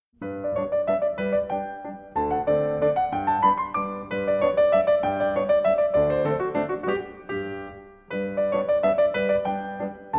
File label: mazurka